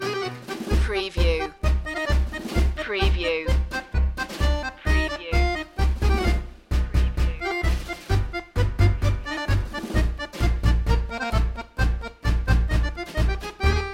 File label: tango loop